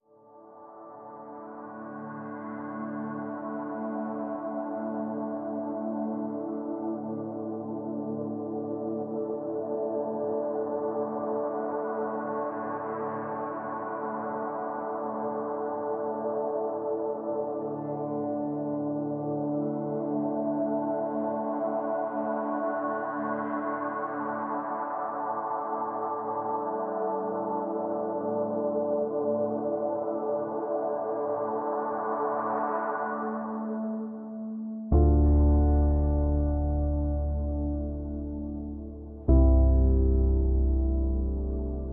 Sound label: Ambient